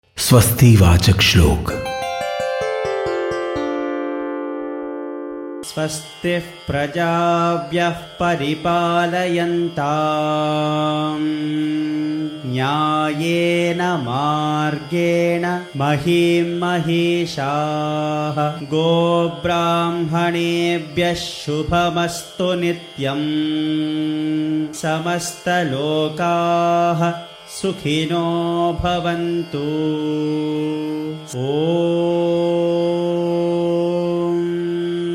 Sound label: swasti vachak mantra